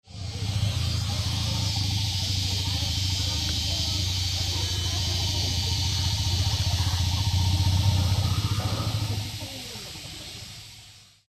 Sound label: rumble